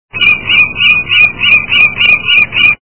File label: Falco peregrinus; Chordata; LandBirds